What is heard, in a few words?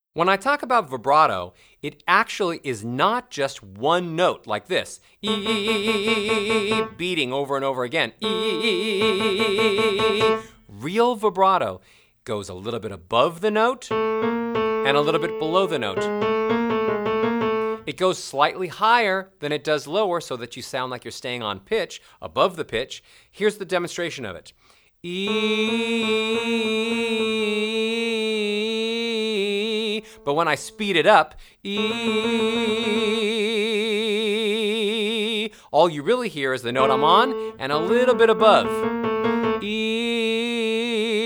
Vibrato